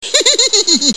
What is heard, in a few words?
xixi; cstrike